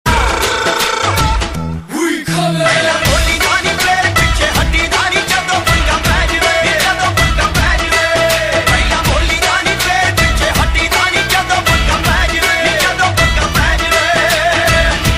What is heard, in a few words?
Remix Punjabi